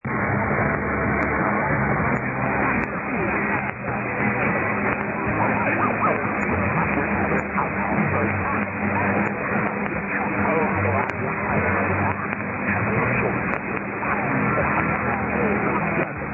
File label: Northern Ireland; 1341 BBC Radio Ulster